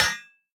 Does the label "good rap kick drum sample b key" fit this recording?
No